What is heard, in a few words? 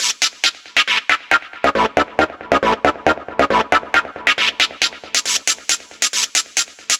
Stab 137 A; 90 sSampleCDs; Club Techno